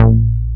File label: A2, J-106 BS; Drums In Your FACE!